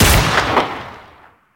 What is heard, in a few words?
shot, weapons; f2000; gmod; fokku tc f2000